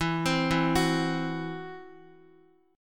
E Suspended 2nd Sharp 5th E, Guitar, 4F,4A,5C,5G,5C,6E; x,x,4,5,4,2; arpeggiated; JGuitar